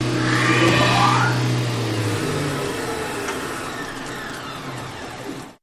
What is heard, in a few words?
Mill Machine Damper Slide With Motor Wind Down